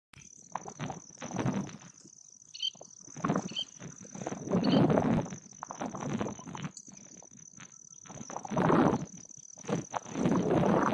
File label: Collared pratincole